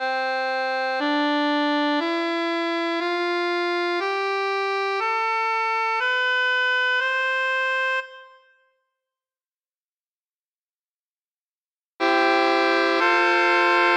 supermajor

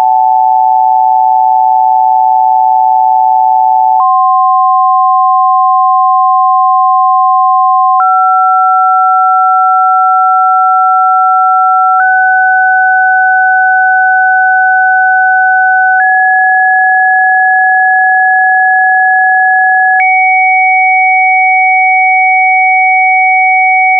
buzz rolloff test

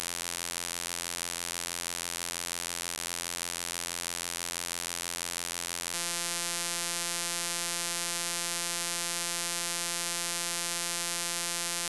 staggered trains

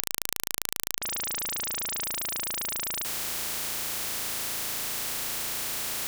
flattest waves full

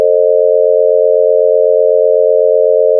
567 sines right

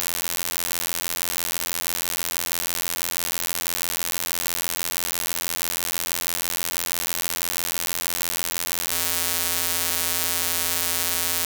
buzz

